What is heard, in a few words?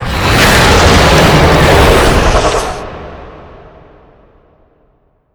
roar sing; gonarch